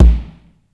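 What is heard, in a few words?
kick, funk kits; Crypton - DrumBase5000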